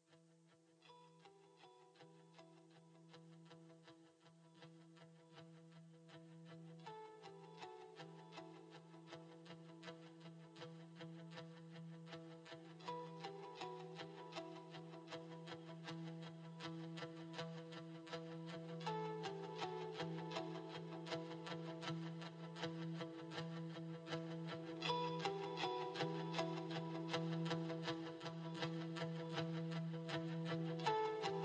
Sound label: Tick Tock Guitar Only